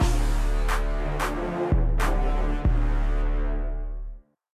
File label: Action; Gangstar City; Android